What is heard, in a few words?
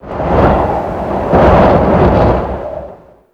Mountain Pass In Ear Wind Gust; Ambience; Warframe; The War Within